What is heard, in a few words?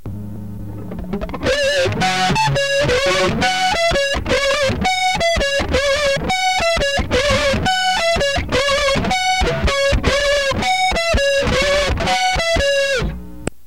guitar